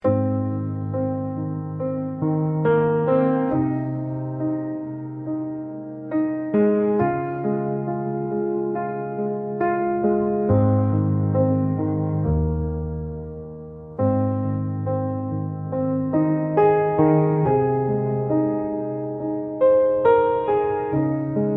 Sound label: Relax Emotional Moments